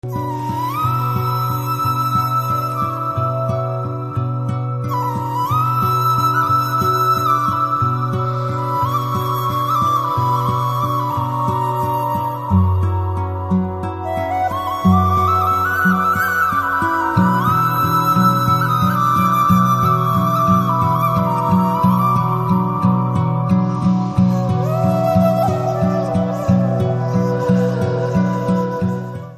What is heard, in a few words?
BGM Flute Telugu Ringtone